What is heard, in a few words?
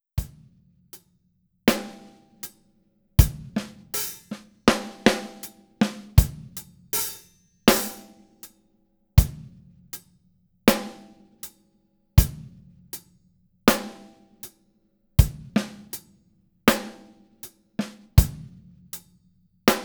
standard rhythm